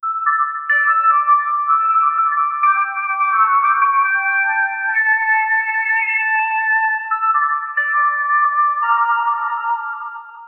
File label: sci-fi melody